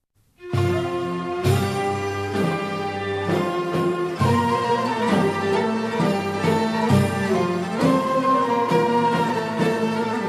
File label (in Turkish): bayati pesrevi neyzen; emin dede